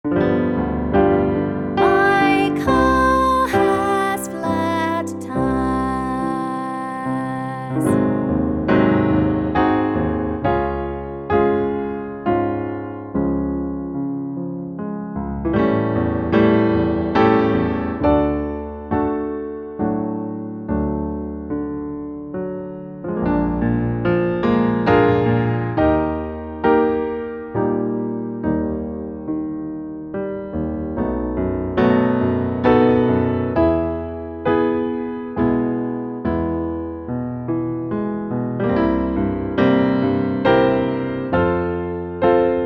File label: Vocal Warm Ups; Vocal Warm Ups Volume 1; My Car Has Flat Tyres